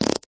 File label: human fart